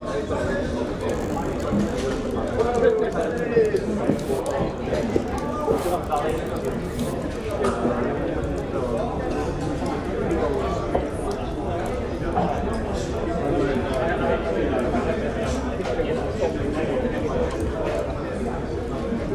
Empty Irish Pub Ambience

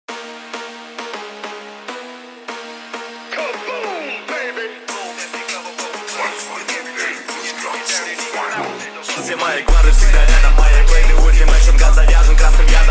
29-37hz low bass

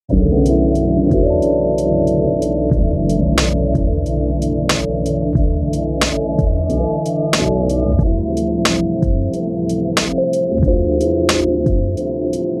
Instrumental

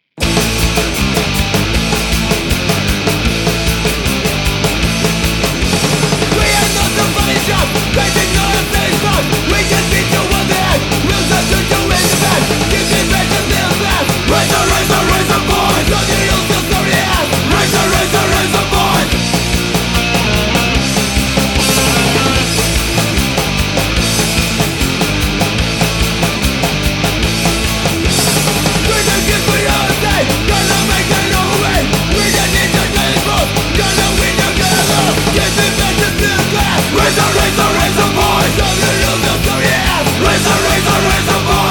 Anthem

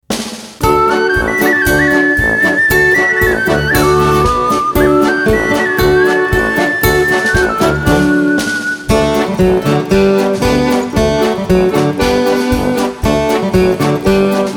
Ukrainian Folk Dance

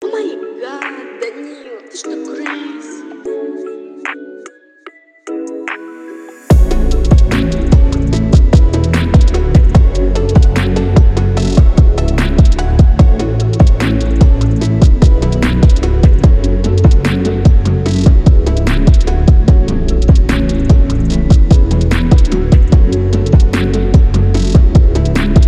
phonk trap flip

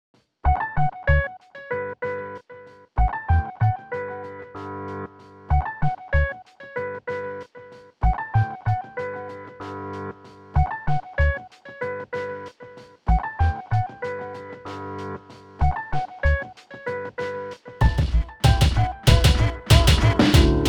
Gymnopedie 90 s Summer Boogie Hiphop Mix

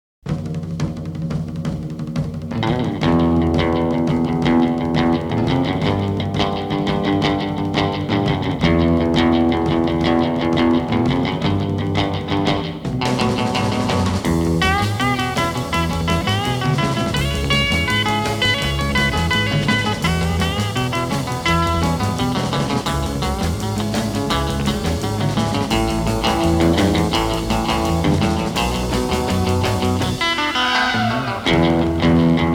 Driving Guitars

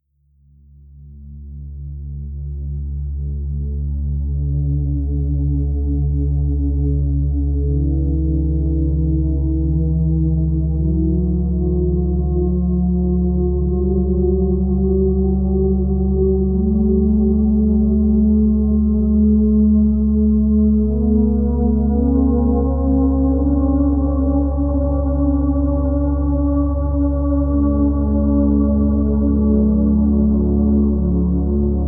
Binaural Beats 20 Hz; Problem Solving

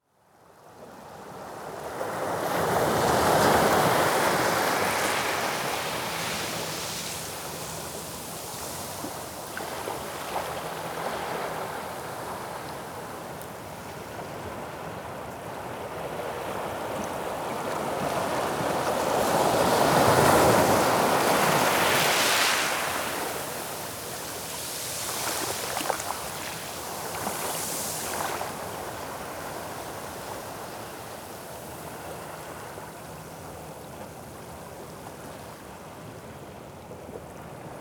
Ocean Sounds Ocean Waves

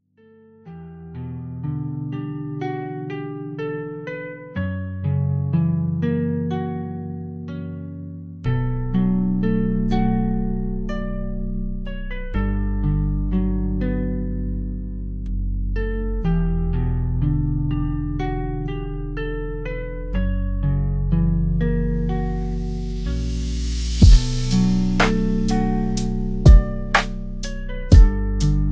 lofi

